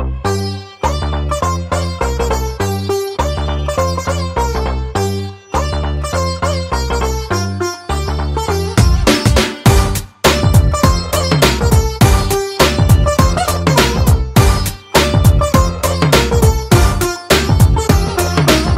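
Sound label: background Tone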